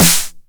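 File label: 909 SD Tape, snare drum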